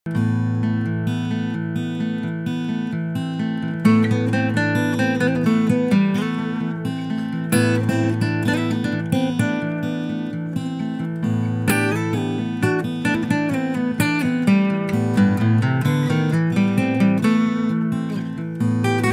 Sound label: Solo Acoustic Guitar Cover